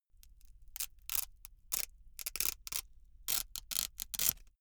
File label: velcro large open really slow